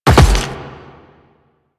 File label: Land Rock, Footsteps; Warframe; The New War; Kahl; Quests